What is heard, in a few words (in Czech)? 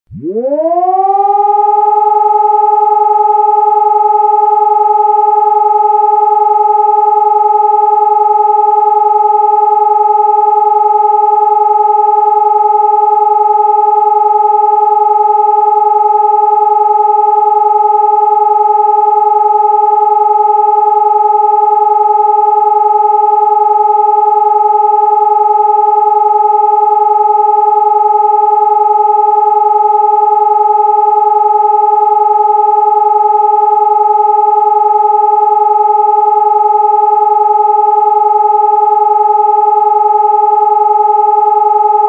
Zkouska siren Elektronicka Mluvici; sireny